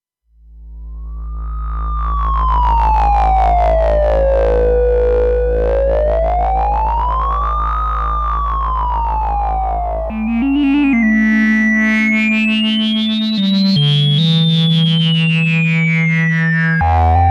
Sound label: arabic Line OSC sync; syst100